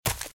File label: Footsteps Leaves Sprint Left; RadwinIsland